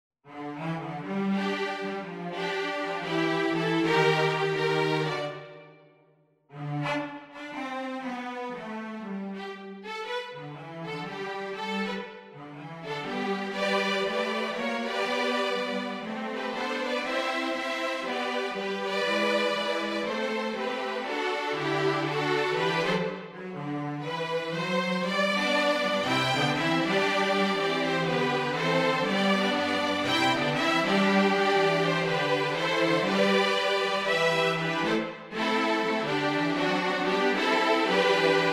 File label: String, string quartet; here we come a wassailing